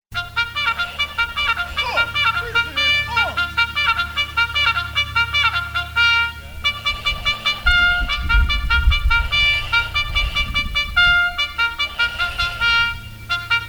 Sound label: Bugle